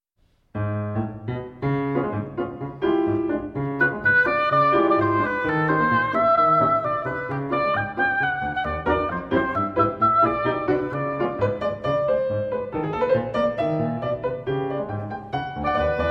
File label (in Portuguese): Sonata Para Oboé e Piano III. Movido